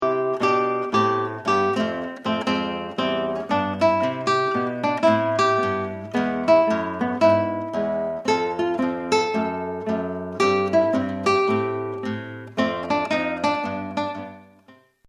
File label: blues